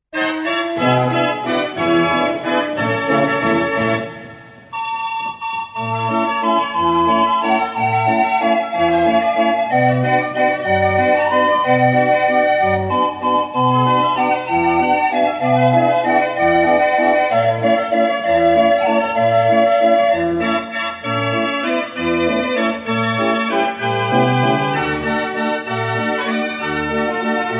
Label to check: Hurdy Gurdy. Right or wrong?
Right